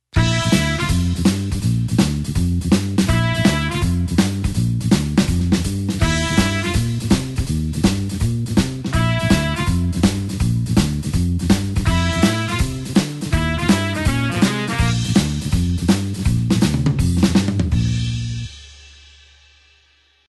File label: blues horns shuffle Bb